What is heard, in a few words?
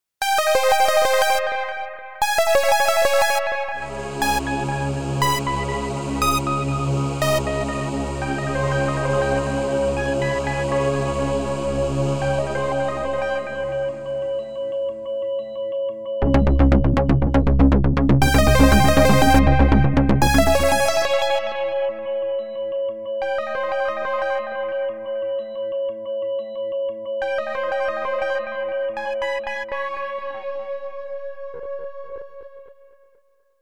synth 120 bpm; etude no 3